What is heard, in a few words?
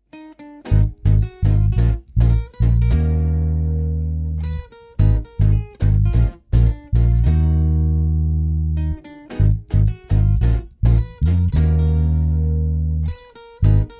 bass